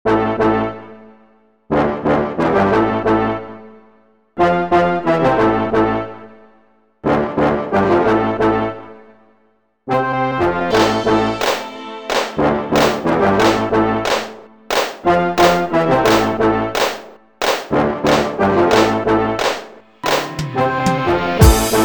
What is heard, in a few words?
bass street